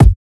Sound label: Kick Hit; Hip Hop Exotica; big fish audio; 094 Emin